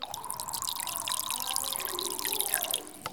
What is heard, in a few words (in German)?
Getränk einschenken